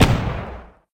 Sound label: cannon fire